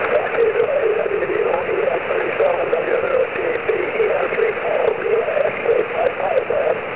Snow SSB

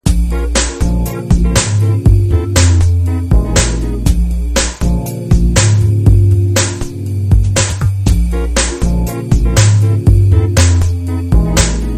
jazz

